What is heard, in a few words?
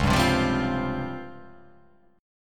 C sharp Major 7th Flat 5th, chord